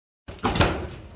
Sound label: open, door; shkaf; garrysmod